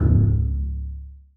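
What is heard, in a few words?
basses pizzicato c3; Sonatina Symphonic Orchestra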